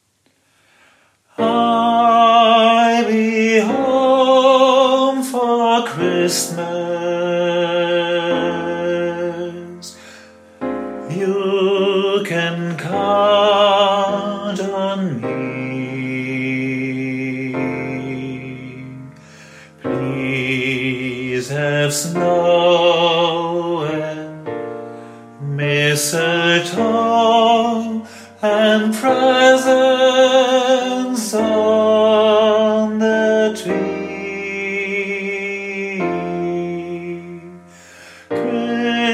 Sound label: Sopran; Popchor